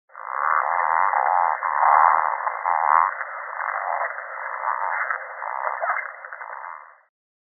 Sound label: Boophis guibei